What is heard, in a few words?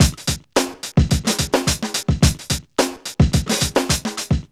FUNKSHUN 108, Funky Elements loops; Zero-G